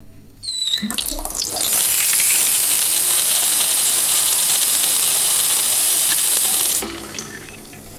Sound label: water pouring into a hot sizzling pan; food-drink